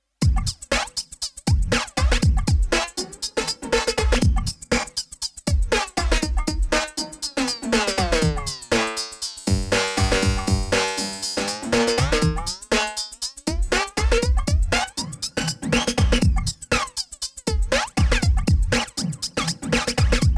Superego plus Flange Drumloop

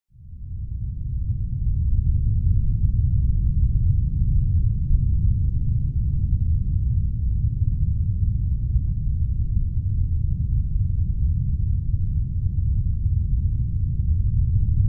Deep Noise with Novation PEAK

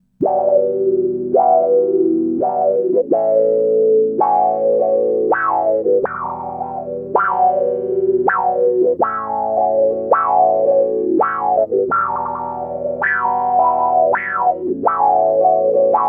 Synth

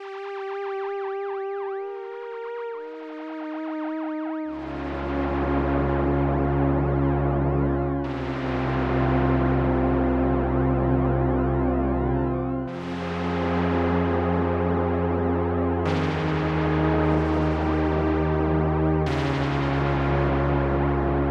Sea Pad

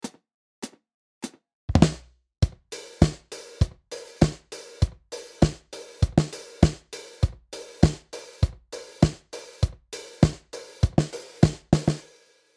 Vintage Dry Kit Disco; Addictive Drums 2

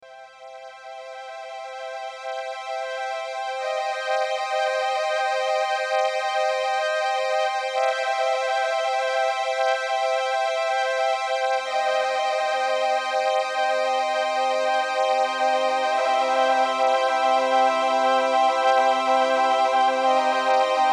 strings voices

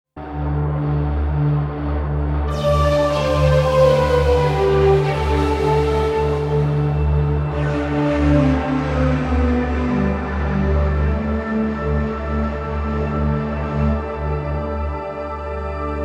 Magic Airy Flute; vs EX-5 Nuage

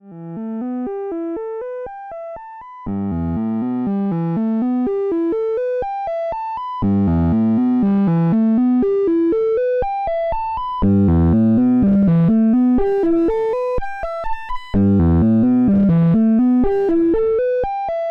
vcf1 Drive LP 50 Distortion sweep

